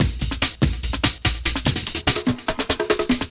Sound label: Jungle, Drums & Percussion, Electronica; Sonic Foundry (Sony Creative Software); Electronic Point Blank